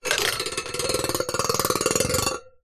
foley metal bolt metal run scrape along small bars resonate